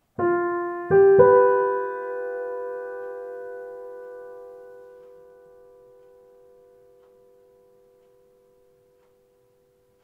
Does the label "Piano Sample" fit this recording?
Yes